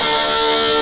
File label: Fuzz Guitar